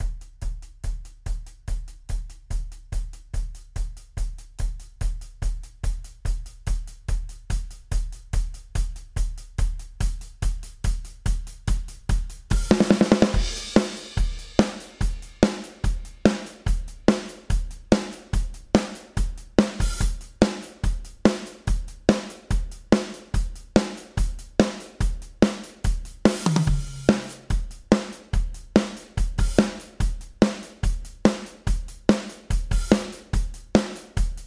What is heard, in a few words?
Rock Song 144 BPM; Ударные для рок музыки; Музыкальные инструменты